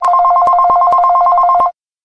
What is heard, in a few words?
classic digital ringtone